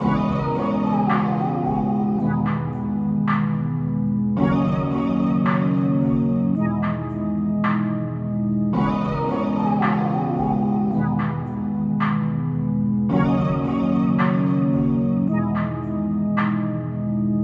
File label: 110 BPM D# Min, R&B hip hop melodies; Oxytocine